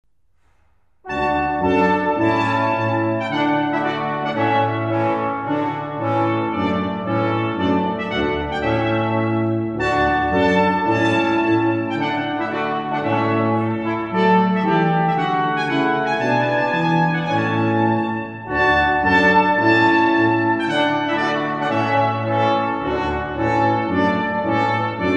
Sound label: Quintet Trumpet Tune; Purcell